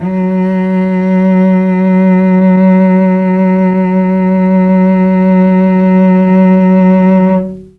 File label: F#2 300 CELLO, Mellotron; Mike Pinder - Mellotron Archive CD